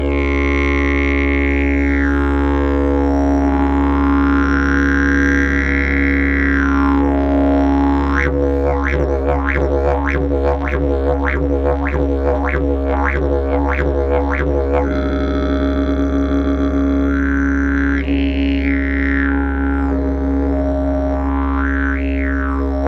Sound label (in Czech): olse zarost, didgeridoo